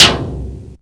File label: HARD PLAT, PERC; cubase